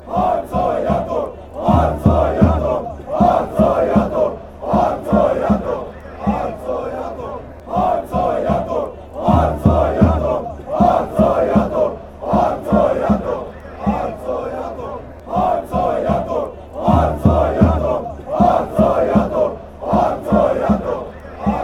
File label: hungary chant